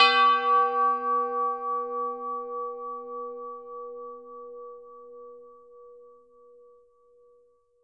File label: tubular bells hit G4; AO bell